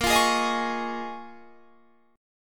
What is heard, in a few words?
D sharp 7th Suspended 2nd Suspended 4th A sharp